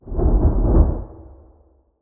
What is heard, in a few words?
ex dimensional portal thunder; Stellaris; other entities